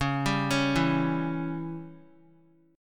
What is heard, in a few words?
Db Diminished, Guitar; arpeggiated; JGuitar